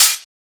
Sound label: Open Hihat, Techno Drum Shots; Drum One-Shots; GhostHack; Ghosthack - Free Techno Drum Shots 2024